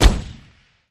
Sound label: Gun Shot Single Silenced